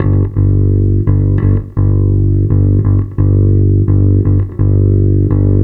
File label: Bass